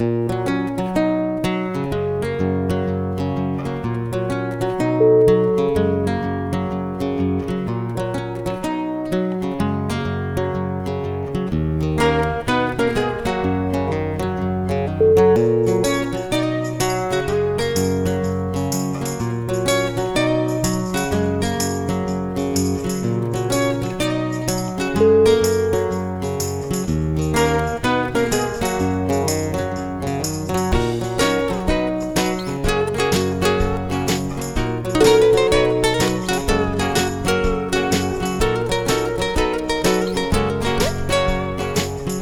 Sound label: acoustic tune